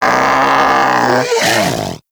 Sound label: predator vocal death; Warframe; Hunting Critters; Characters